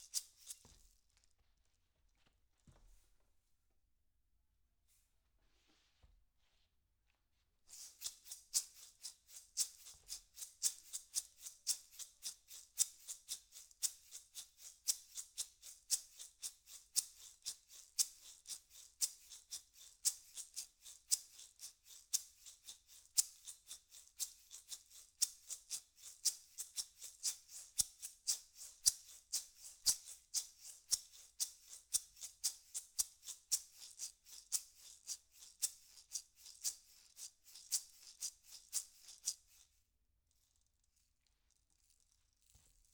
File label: PERC OH M